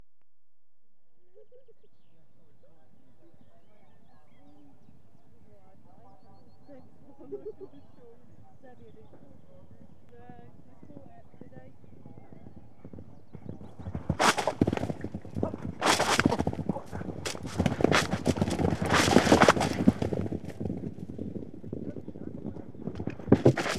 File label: Point TO Point Racing, Large Fiel, Equestrian Events; BBC Sound Effects Library